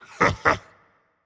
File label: heh heh rage; chaac